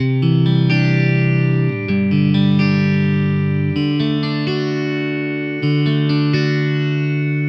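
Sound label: Electric Pop Guitar 128BPM G major; Vengeance - Essential Clubsounds Vol.4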